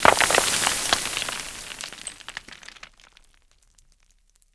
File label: dirt slide; mines